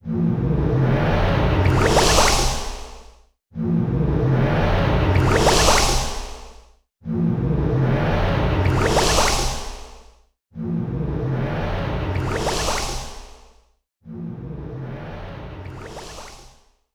bubbles whoosh; Fly'n

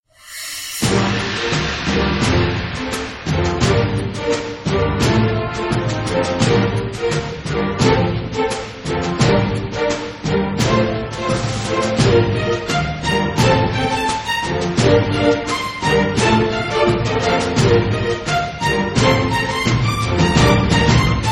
Music; Brave Fighter 2 Monster Legion; Android

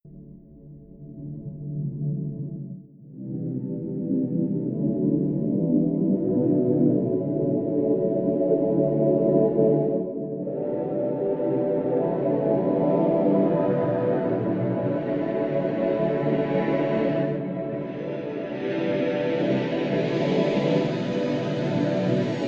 Ding Ding; ends here ends now original soundtrack 2020

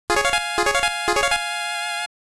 Fanfare (Battle Start); fire emblem the best vol. 1

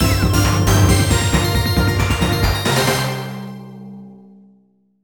Victory!; Digimon World DS; Nintendo DS

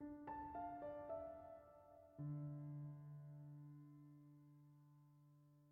Piano; Frozen Flame; windows

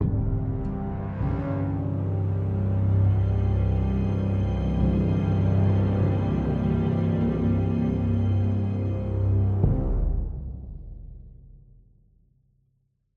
Sting Doom

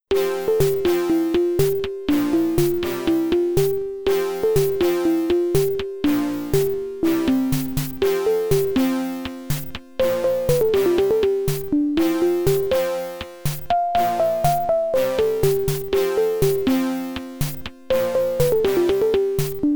London Bridge is Falling Down, IBM-PC AdLib; lemmings

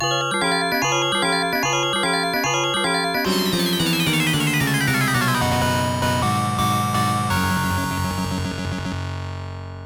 jingle less than 15 seconds remaining ~ game over; halley rise!; Capcom Game Music Volume 1

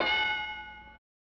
Clang; Family Feud; SNES

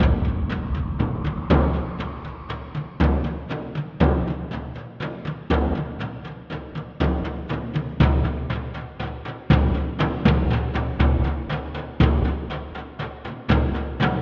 Npc Combat Tom Rythm

